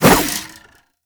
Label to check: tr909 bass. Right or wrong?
Wrong